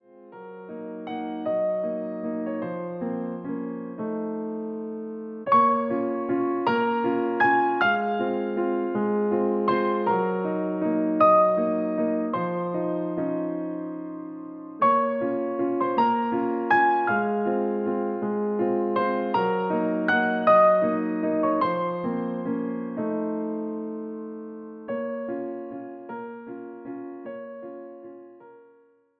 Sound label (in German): Klangprobe Sternschnuppen